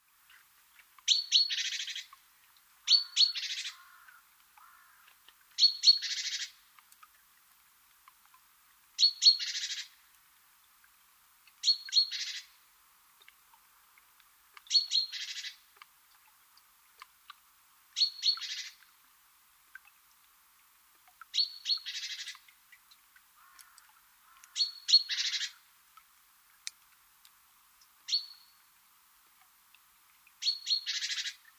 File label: Parus major; SETE